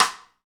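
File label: snare drum rim; Sound & Vision - Gigapack I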